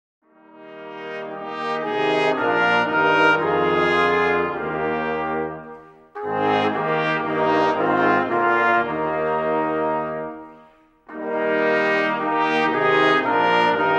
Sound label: Fanfare; Cruger